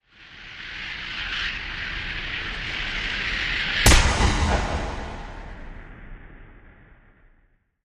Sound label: Course Rumble And Hard Impact Sweep